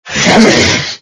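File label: lizard attack; rustbot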